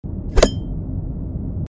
Ninja Star; Infiltrating The Airship

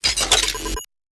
Sci-Fi Weapons-Loading Fx

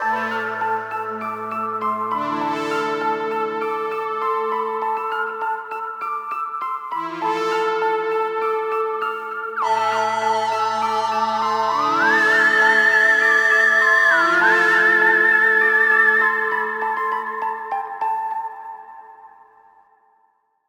Acolyte Stinger

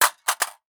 Physics Weapon Impact Soft; Counter-Strike: Global Offensive

